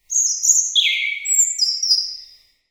birds chirping

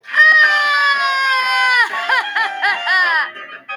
boogeyman laugh; funnyman

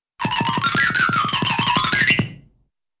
running footsteps and xylophone scales

